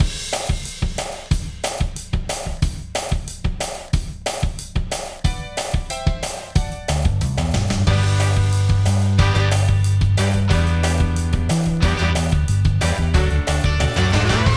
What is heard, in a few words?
SNARE; STAR NURSERY MUSIC; DMC